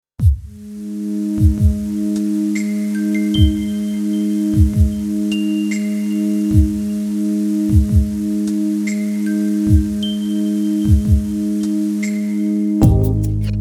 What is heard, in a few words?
rain inst